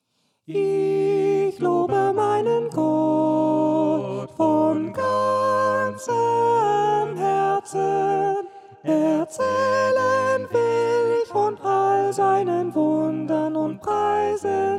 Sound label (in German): Ich lobe meinen Gott Sopran; Letztes Chorprojekt